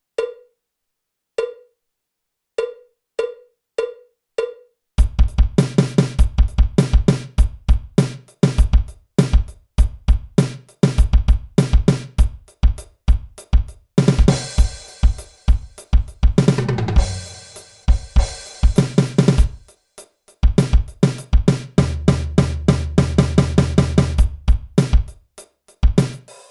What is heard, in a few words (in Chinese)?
架子鼓独奏 爵士融合; 66条架子鼓独奏SOLO